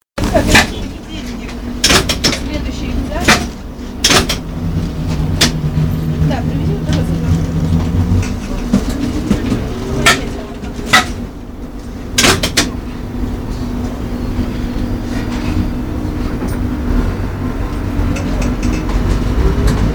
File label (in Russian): trollejbusa i golosa ljudej